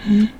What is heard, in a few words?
hum him